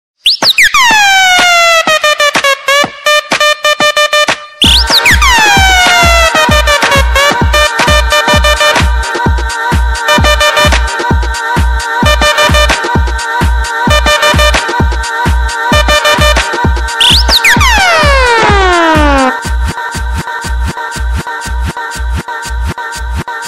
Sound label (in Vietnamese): nhac chuong am luong lon cho nguoi gia